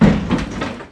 bloody knight die

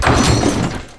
alarm die

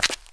step; metaller

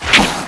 attack; SCALLEG

